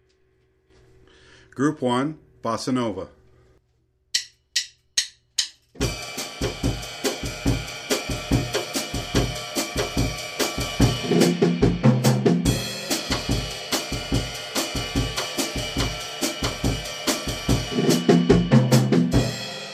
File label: bossanova